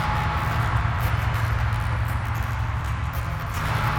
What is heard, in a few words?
sparse soundscape samples; ReLooped